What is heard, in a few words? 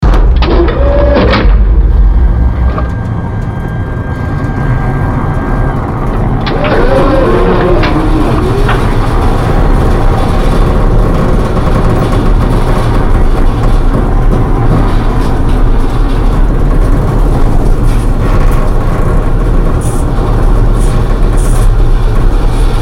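Ambience Shuttle Travel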